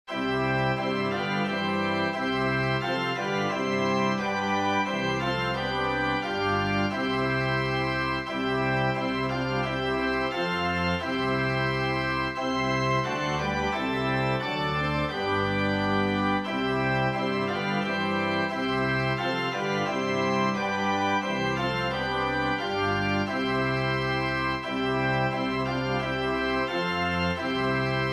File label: A Charge to Keep I Have Organ